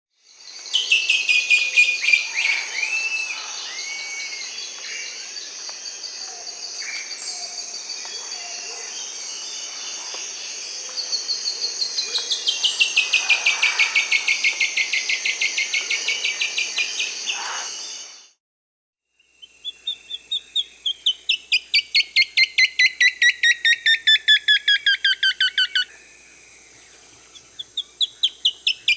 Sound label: Spix's Woodcreeper (Xiphorhynchus spixii), Peru; S. America